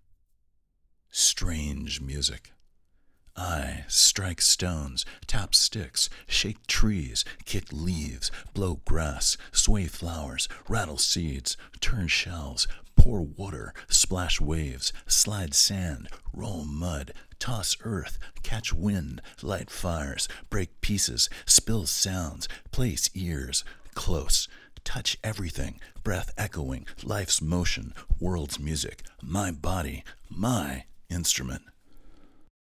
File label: Strange Music